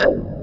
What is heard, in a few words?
HOLLOW PERC, JV 1080; Pro-Rec - Synthsonic